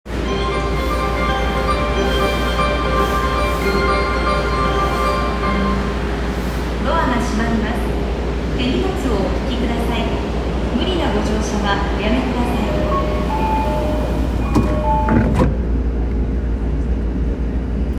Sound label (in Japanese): 千代田線代々木公園西, train sounds